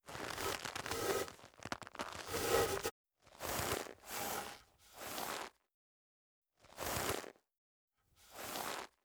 Rope Pull Pulley Stress; Fairlight Sound Lib

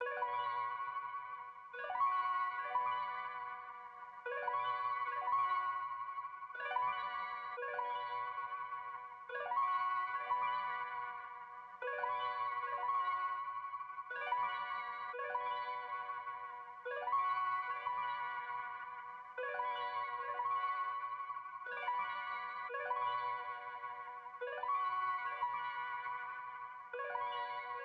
127 BPM B Min Harp; Trap Melody Loops; Loop Stems; Cymatics; Spirits

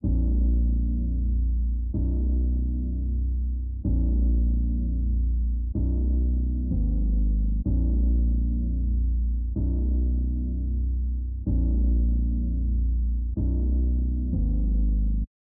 126 BPM D# Min Bass; Trap; Loop Stems; Cymatics; 2022 Melody Collection; Trap Pt 1; Cold Feet

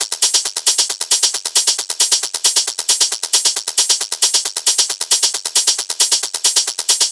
Hat Loop 135, Techno

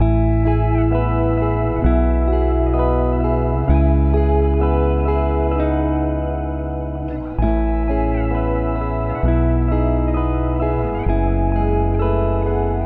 Mountainside, 130 BPM E Maj, Guitar Loops; Cymatics; Journey Guitar Loops

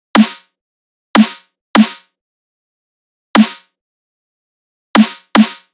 Dubstep Drum Loop Snare, 150bpm; Stem Seperated

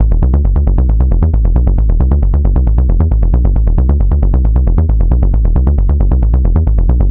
Bass Loop A 135, Techno; Ghosthack; AC2024 - Day 1 - Techno Sounds 2025

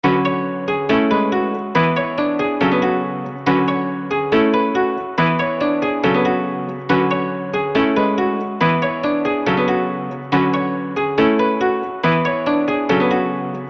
Melody Loop - 140 BPM F# Min, Hip Hop; Cymatics - 9God Hip Hop Sample Pack

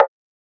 Percussion

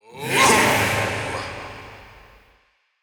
Processed Battle Shouts, Viking Vocal

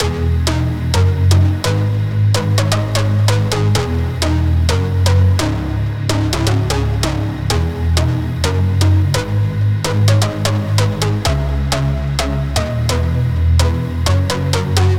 128 BPM G# Min, House, Breakdown Loops; Take Me Away; Cymatics

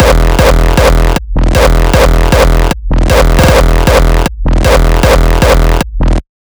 kicks loop 155; GhostHack

